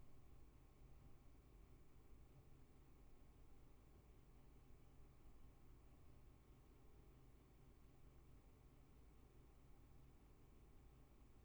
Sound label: roomtone